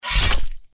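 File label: deathclaw claw attack; npc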